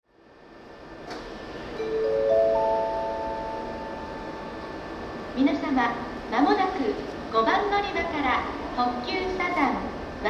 bell, station; nankai; namba

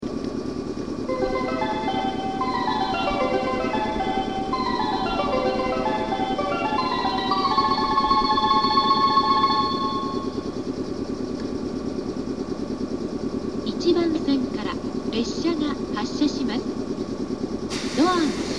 bell

